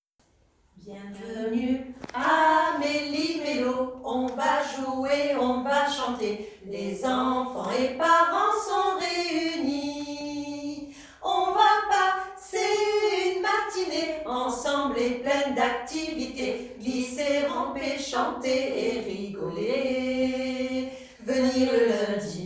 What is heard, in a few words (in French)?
Chanson d accueil meli melo